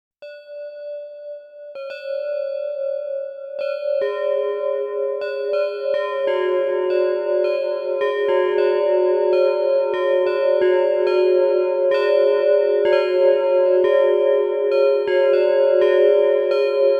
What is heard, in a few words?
German-Style Ringing, bell